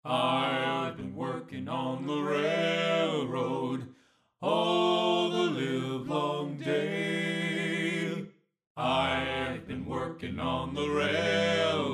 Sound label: Barbershop; Soundiron Questionably Barbershop